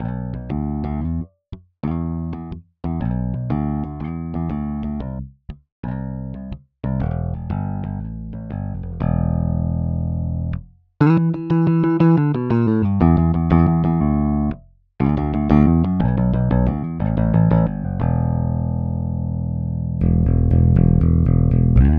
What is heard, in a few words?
Articulation Medley Vintage Picked DI; Virtual E-Bass; Toontrack EZbass; Songwriting Tool